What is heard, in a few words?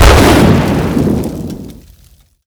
husk fireball explode